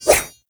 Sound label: Kunai Whoosh; Weapons